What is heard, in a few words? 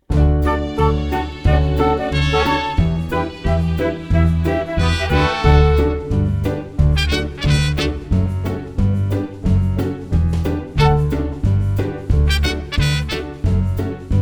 Karaoke Version